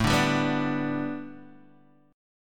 A 7th Suspended 2nd Suspended 4th Guitar Open D; x,0,0,1,2,0

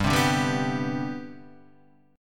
C Major 7th Suspended 2nd, G, chord

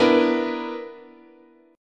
B Minor Major 7th Double Flat 5th, guitar chord

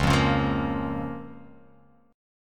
C sharp 6th C sharp down

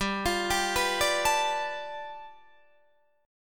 G 9th, Guitar Open D

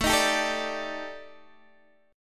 D sharp Major 7th Flat 5th, A, guitar chord

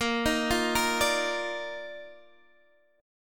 Eb Major 7th Suspended 2nd Bb

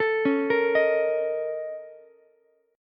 Bb Minor Major 11th Bb, Ukulele; BbmM11; arpeggiated; 14,13,18,18; JGuitar

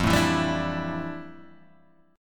F Major 13th Guitar Half Step Down

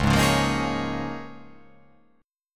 C sharp 6th Add 9th C sharp down

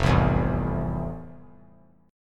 F Major 7th F; strummed; JGuitar

